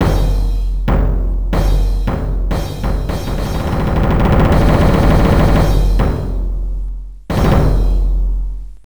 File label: Drum Roll I; Amakusa Demo